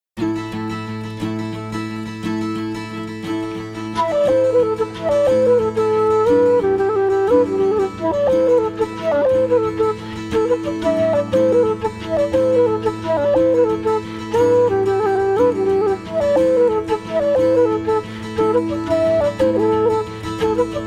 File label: irish, dance